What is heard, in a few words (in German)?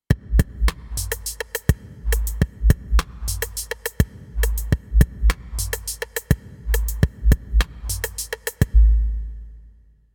Roland MX-1 Beat BFX Sidechain auf BD SN mit FX